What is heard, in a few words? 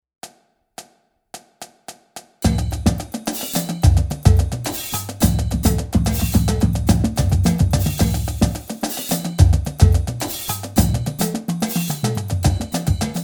Chuggeda Inverts 108, drumline